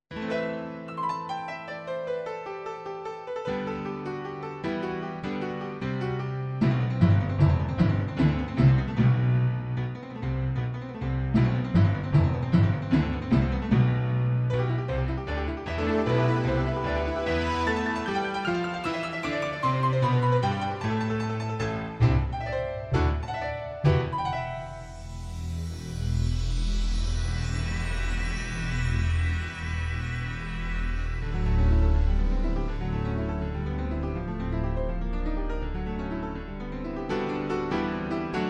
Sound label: alesis; MIDI environment from the 1990’s